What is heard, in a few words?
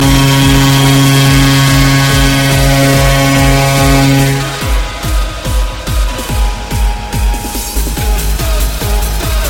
goal horn; flyers